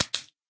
flop, guardian; minecraft; mob

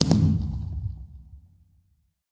large Blast far, fireworks; minecraft

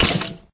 hurt, skeleton; minecraft; mob

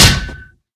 metal, mob zombie; ayuncraft-1.5

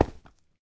stone, step; minecraft

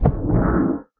elder hit, guardian; mob; minecraft

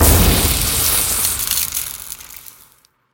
remedy, mob zombie; ayuncraft-1.5

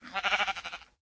say, sheep; minecraft

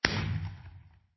blast far, fireworks; ayuncraft-1.5

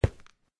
stone, step; eaglercraft 1.5

